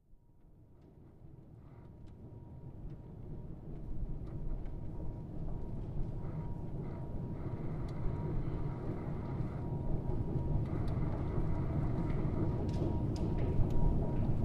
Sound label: Top Of Escalator; field